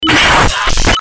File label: Leg Breaker, Breakers & Transitioners; Best Of N2O Library